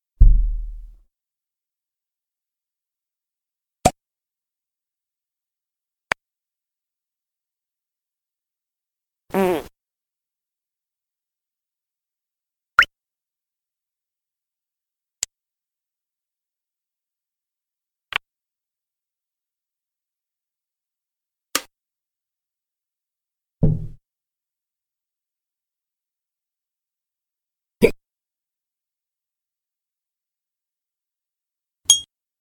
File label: verdaechtige geraeusche suspect sounds; klaus osterwald